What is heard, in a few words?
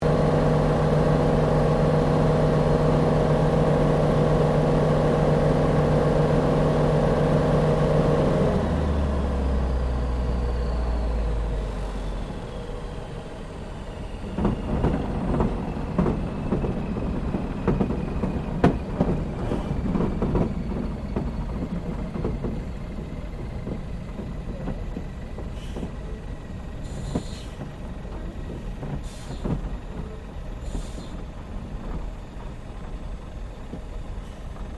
Aircraft Yakovlev Yak-52 Landing Interior, Russian Yak52 Training aircraft